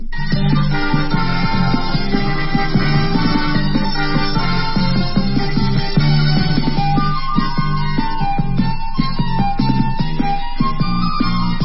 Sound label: hora, instrumental